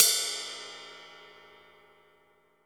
20 RIDE RIM

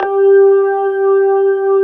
B3 HAM, Keyboards Old School; USB Soundscan vol.09; AKAI

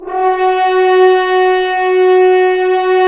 BRS French Horns; Roland - LA Composer vol. 1

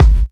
Kick, Kickdrum; Equipped Music; Loungin House

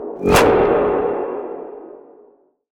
Industrial Hits; Antidote Advent

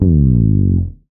slides, bass; Spectrasonics; Bass Legends CD 1

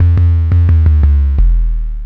MoveOnBass 175, bass

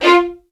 F4, LOUD VIOLINS; Q Up Arts; Denny Jaeger Master Violin Library

